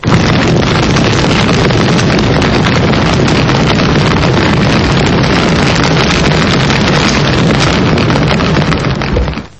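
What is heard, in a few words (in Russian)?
пожар шумный активный четкий близкий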